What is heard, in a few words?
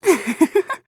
giggle